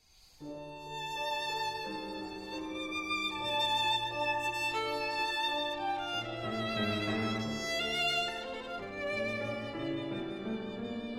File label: Violin Sonata in D Major, Moderato, Live; Prokofiev; Violin Sonata No. 2; Op. 94bis I.